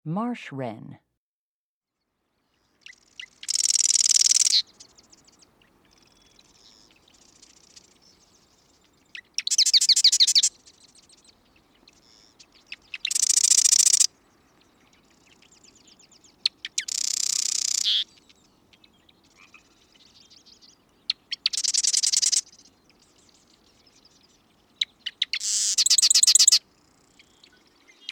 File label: Marsh Wren; Las Gallinas